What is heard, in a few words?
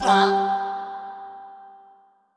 Organ Note; Mario + Rabbids Kingdom Battle